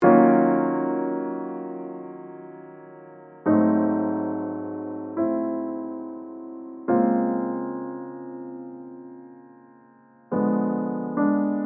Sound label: 70bpm db lofi; Suno